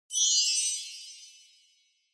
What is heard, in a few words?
Bell Accent, Dry